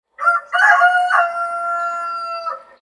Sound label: rooster sound